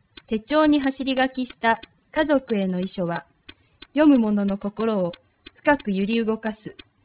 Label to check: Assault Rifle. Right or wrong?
Wrong